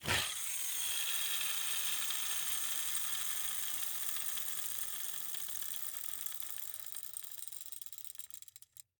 Rollerskates, old fashioned, all metal, wooden wheels, swipe, spinning, slow to stop, shifting; Eiravaein Works; Vaeyan IV